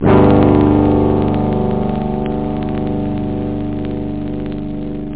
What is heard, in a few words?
guitar; da capo vol1